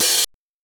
Oberheim DX

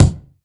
BD, BASS DRUMS; EdgeSounds

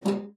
PLOK F#3, spinet; Xsample VOL-7

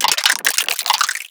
vynil fill

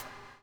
BUBL; Spectrasonics - Backbeat

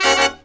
D# DBL HIT; E-MU Formula 4000 Series Vol. 1; Hip Hop Nation

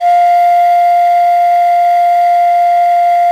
DANCE, American Natives

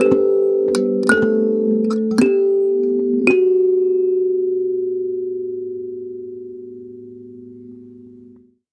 Kalimba, African

